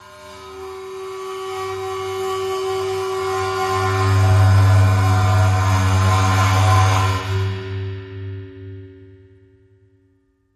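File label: Splash Cymbol Bowed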